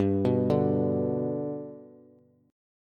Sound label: G Major down Bass; G arpeggiated; JGuitar